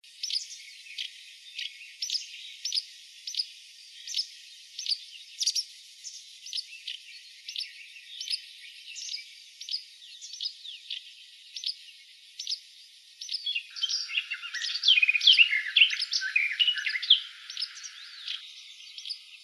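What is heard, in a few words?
tryasoguzka slavka, wagtail warbler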